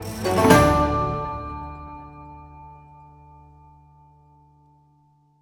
stinger Power Up Room; lego horizon adventures

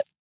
Pick Up Coin

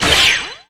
Team Skill Fullup

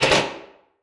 Arrived

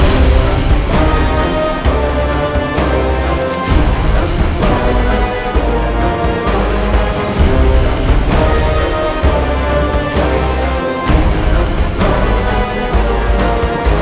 Finale Loop

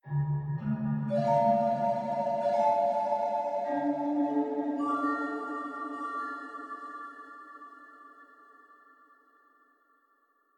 windmill jingle

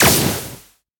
Drift Ind Max; boom karts multiplayer kart racing android

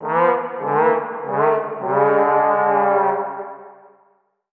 Bonus Ball Miss; gamerip; MLB Home Run Derby; Android, iOS

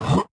Attack; Joblin Joblifter; Going Under

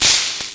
Electro Hit; Submarine

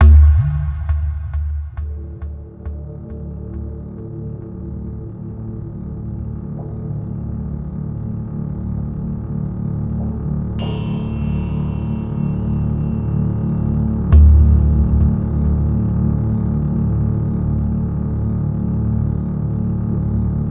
Amb Outdoor

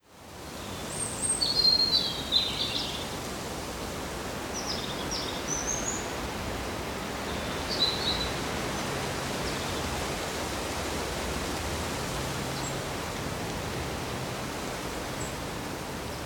Forest

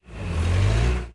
Forklift Car Moving; Subway Surfers City; Android, iOS

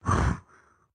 Dash Attack; Going Under; Caffiend Caelan

